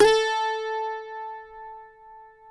CUATRO A 4, Guitars; Spectrasonics; Hans Zimmer Guitars VOL-2

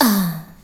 VOX SHORTS; Megabass Remix! 2

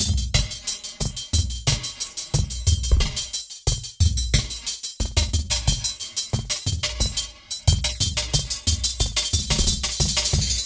squarechops 090bpm, downbeat dance grooves; Zero-G

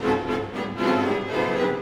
Orchestral Loops

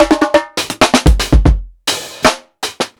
PICCOLO BONGO FILL, breaks, drums; Basement drums vol 2

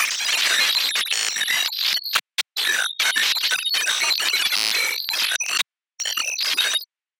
Glitch; Kyutatsuki

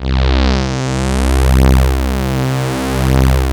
MX FILTER C2; Q Up Arts; Dream Experience

